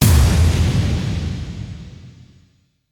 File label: Impact